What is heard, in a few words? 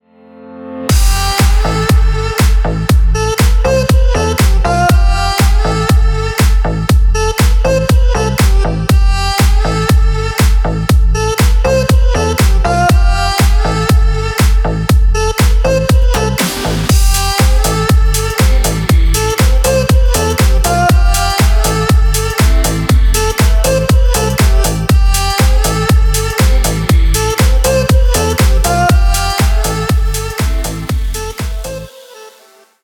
Erhu